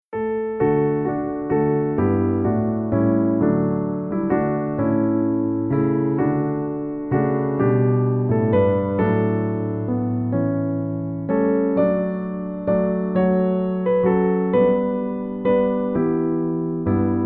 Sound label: hymn